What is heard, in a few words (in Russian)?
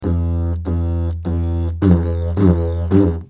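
диджериду пердящий звук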